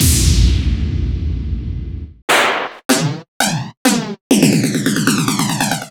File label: zaps; Cartoon - film effects